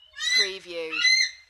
American Bald Eagle squawks 2 times

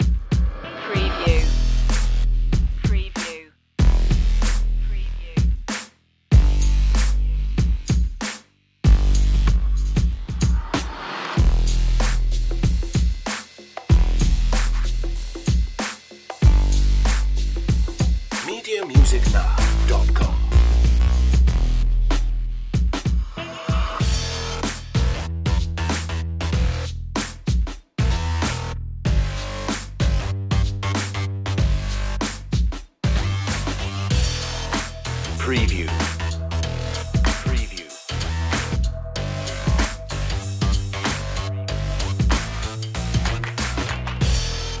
drums and bass

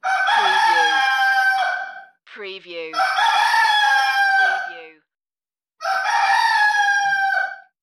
Rooster Crows Three

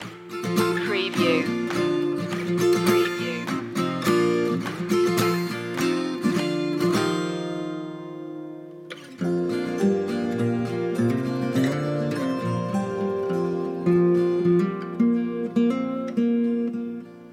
barn raising loop

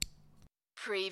Lego, two lego pieces clack together

